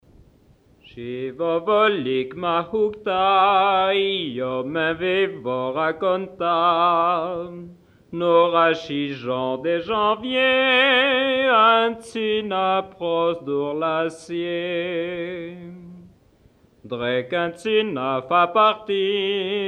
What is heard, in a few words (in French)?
patois de Zinal Anniviers chants; patois valaisans; La saison dhiver a Zinal